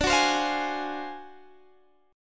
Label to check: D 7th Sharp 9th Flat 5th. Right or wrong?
Right